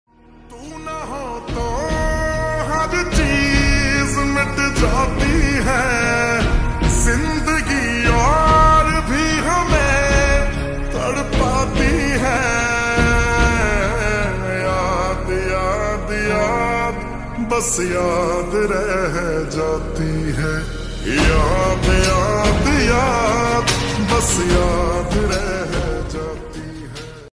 song ringtone slowed reverb

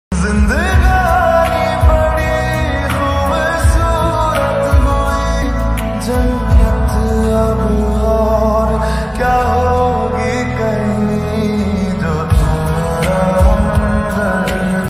slowed reverb song ringtone love ringtone

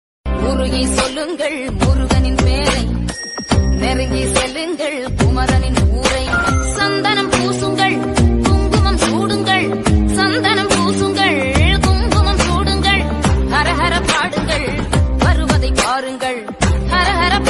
murugan song murugan ringtone tamil bgm

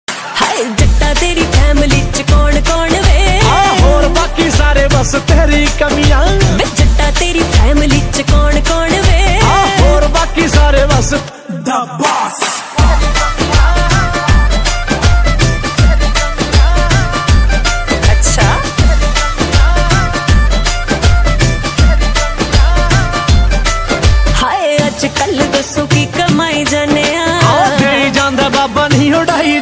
punjabi songs ringtone

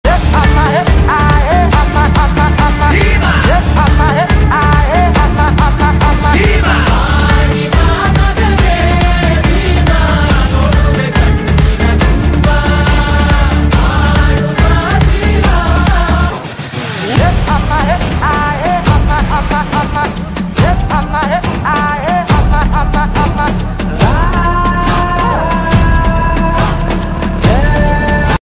kannada ringtone, bgm ringtone; bheema kannada movie

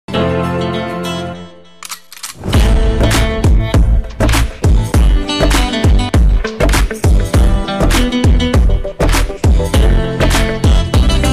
instrumental song ringtone

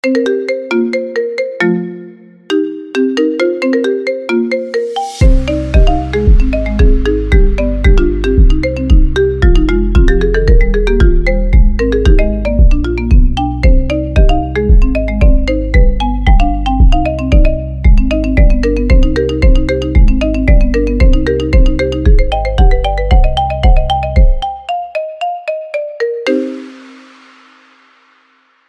marimba ringtone; chainsaw man

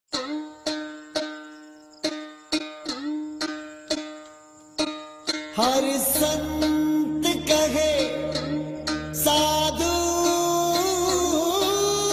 motivational ringtone; lagaan

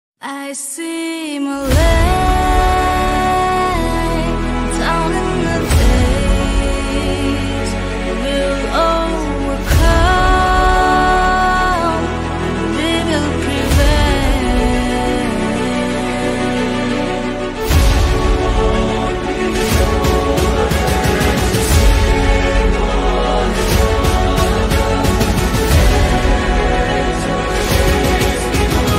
bgm ringtone trailer music

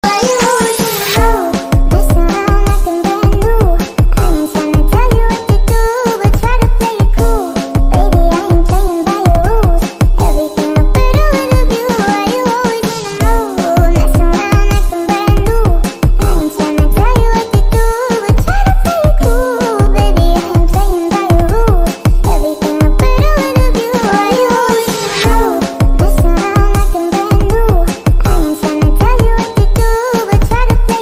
cute version ringtone

